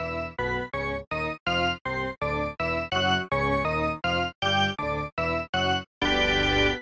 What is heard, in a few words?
Organ Chant